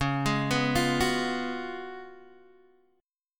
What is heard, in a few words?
C sharp 11th C sharp, Guitar, Open G